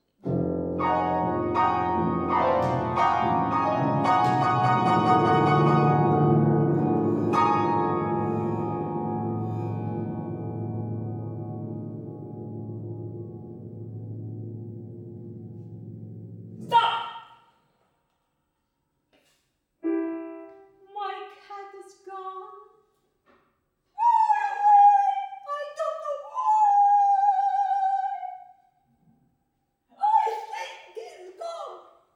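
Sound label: tape robots piano ocean drum and inhaling choir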